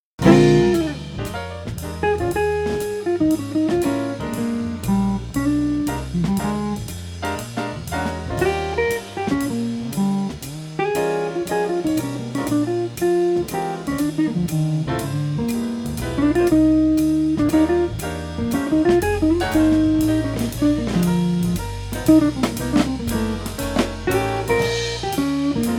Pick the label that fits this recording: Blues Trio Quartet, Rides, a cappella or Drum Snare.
Blues Trio Quartet